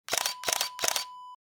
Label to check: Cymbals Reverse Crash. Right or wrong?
Wrong